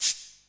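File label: percussion, Drum & Bass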